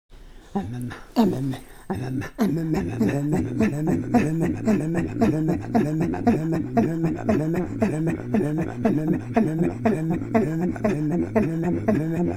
Throat Singing, INUIT

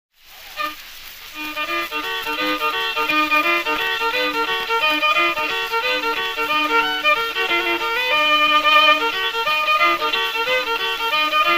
Cajun Waltz; usa

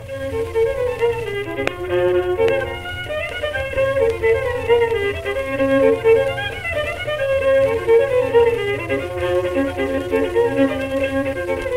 square dance; michigan; MICHIGAN 1938

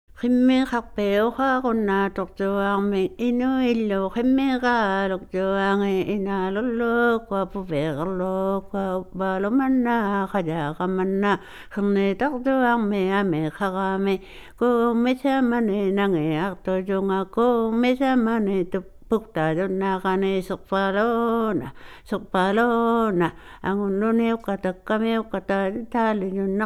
Juggling Song, INUIT

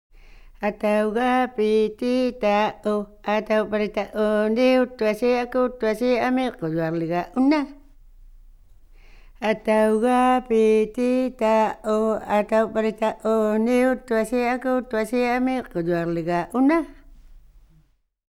Counting Play Song, INUIT

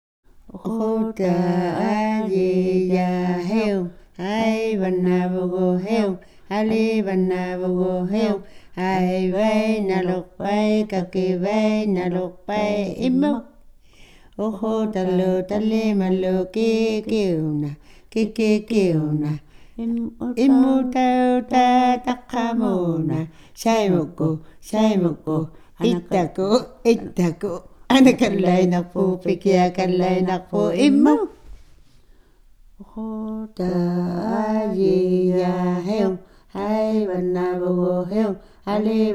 Hide-and-Seek Song, INUIT

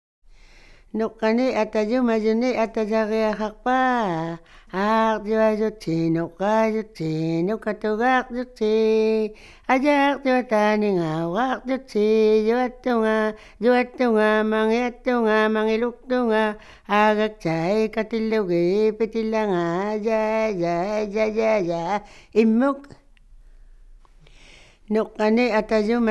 Counting Play Song, INUIT

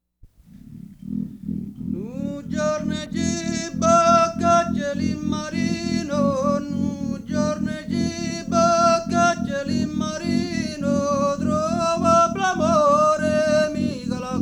Ballad with Friction Drum; Serenata alla Cupa-Cupa; Italian Folk Music Collected in New York

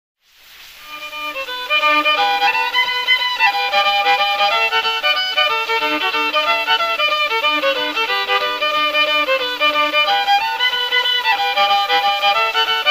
Cajun Waltz; usa

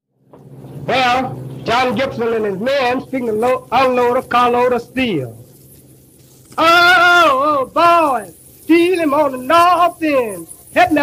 Steel-laying holler; appalachia; usa

